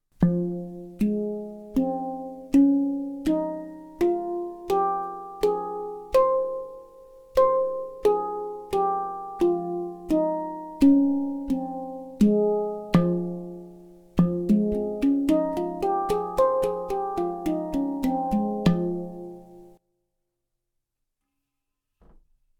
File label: equinox fa spacedrum nitro handpan pantam